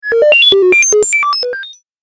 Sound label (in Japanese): ピコピコ メカノイズ